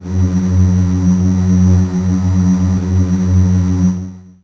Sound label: syn f#1, FAIRLIGHT; ProSamples vol.55 - Retro Sampler